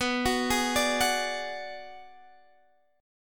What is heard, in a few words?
B-Minor 6th B down Guitar Standard; Bm6 arpeggiated; JGuitar